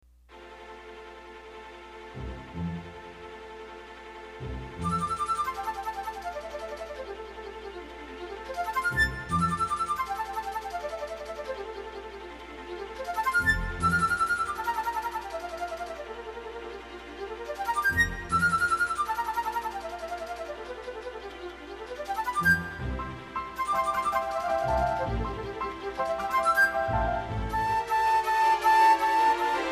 voliere; saintsaens; animaux

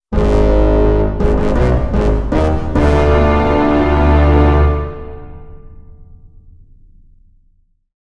violent, jingle

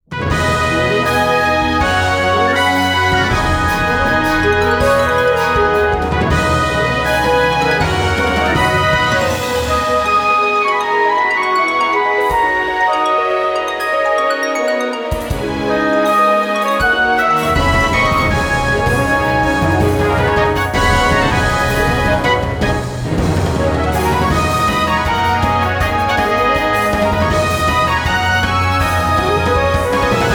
battle; 戦闘音楽E; 白熱・大ボス

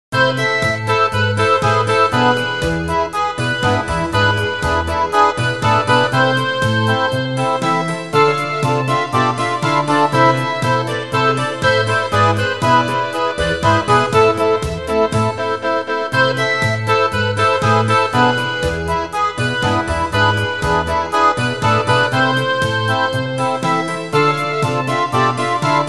tango

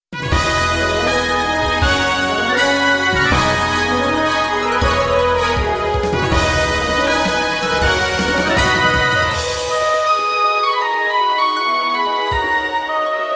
battle

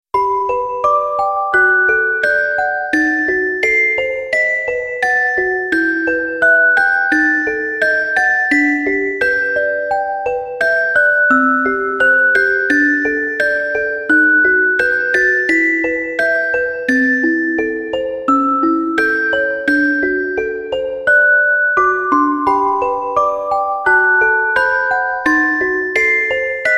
scene; シーン別音楽A; オルゴール・素朴・明るい

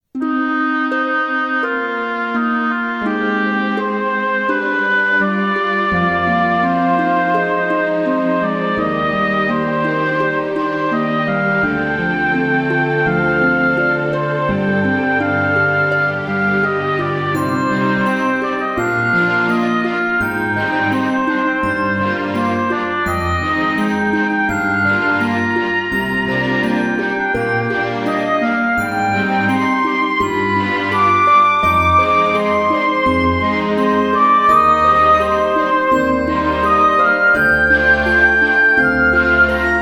scene; シーン別音楽B; 暗い・切ない・やるせない

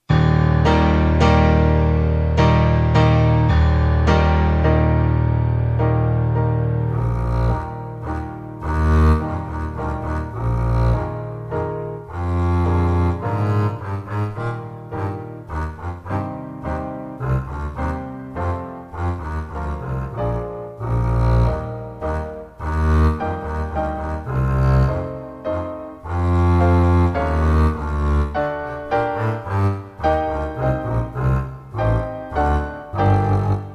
elephant; saintsaens; animaux

